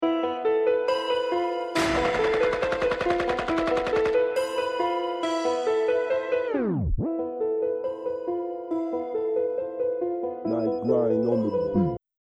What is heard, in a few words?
bomb ten sec count; music